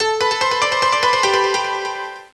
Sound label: piano; Angelic Layer